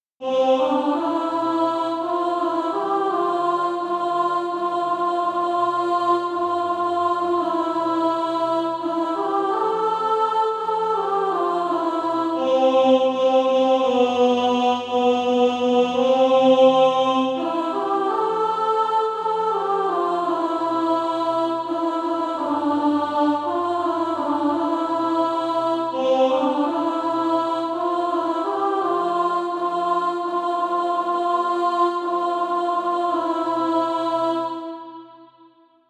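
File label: alto, SATB Practise Music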